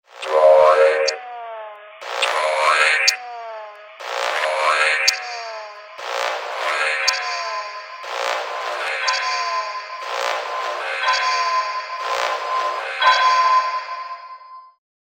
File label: droid chime ringtone